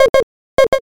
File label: frog